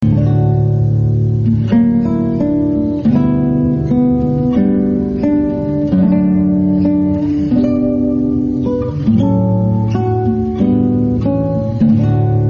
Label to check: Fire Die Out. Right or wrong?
Wrong